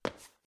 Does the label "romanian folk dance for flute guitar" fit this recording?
No